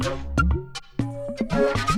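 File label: Music Dpad Base 120; from dust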